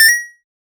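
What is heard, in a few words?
emerald diamond collection, coins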